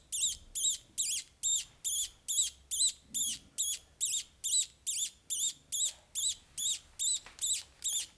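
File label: Lagurus lagurus juvenile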